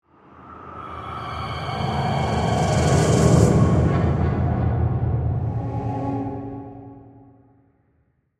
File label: Stab Swell Suspense